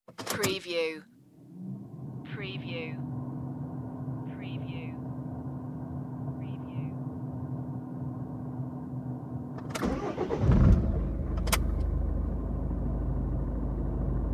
car idle ignition

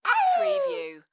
Baby Talk "Ahooo"; sound effects bible

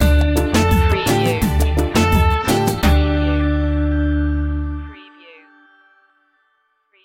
latin caribbean reggaeton stinger

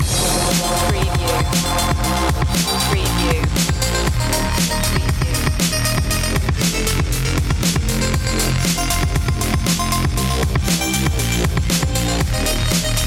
oriental chaos loop

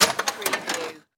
Tool Grab Toolbox; sound effects bible

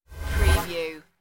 robotic interface message

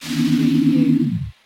Hi-tech Panel Servo Button

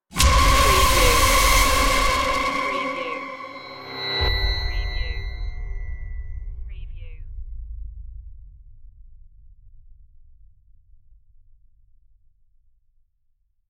trailer stinger

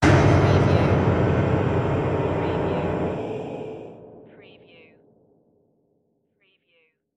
Piano horror smash; beanstalk audio

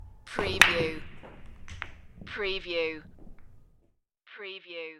Pool Break; Sports & Games / Billiards, Pool & Snooker

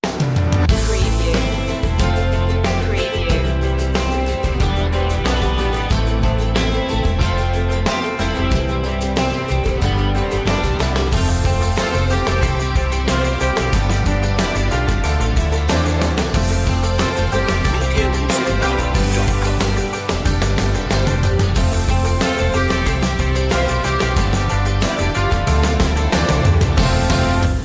open door

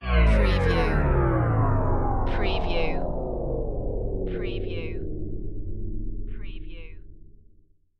Super Low Dive

